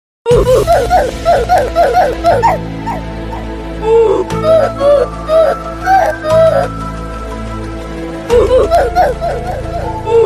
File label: illuminati song remix; roblox